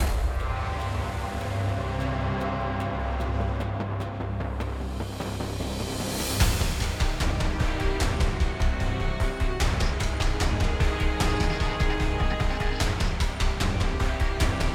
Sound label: Battle Ruin castle